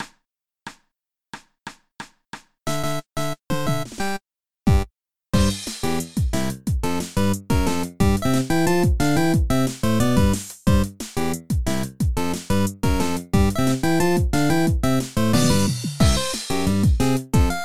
Xylophone Home (Off Beat!)

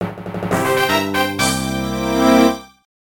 Music Minigames Vs. Battle Deciding Game; NSMB

